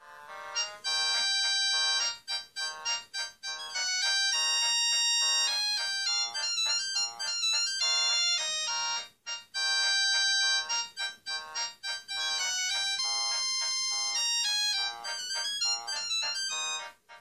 samsung sgt100

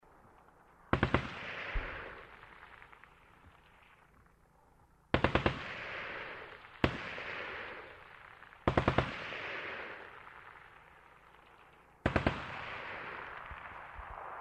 Battle Far

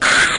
Spider; Hexen 2

